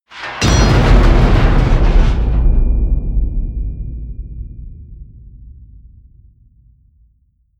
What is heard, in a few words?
hit metal